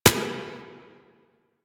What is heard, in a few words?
Turret Low Clip; Weapons; APC; Warframe; Lasrian